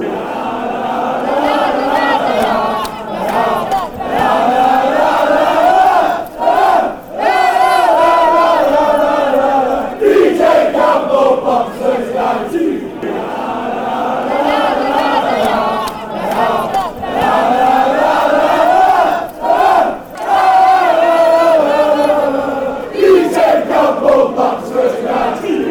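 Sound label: fanchants, football chants; dj campbell blackpools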